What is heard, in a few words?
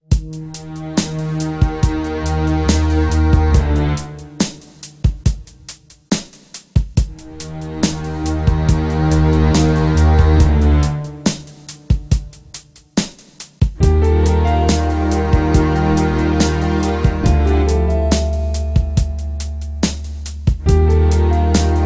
Warning; Heroes Phantasia